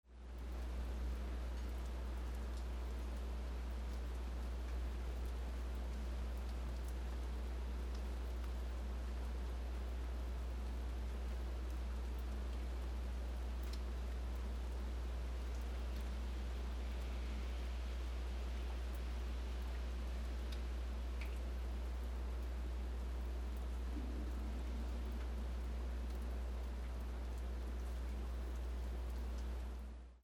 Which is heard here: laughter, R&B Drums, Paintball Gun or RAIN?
RAIN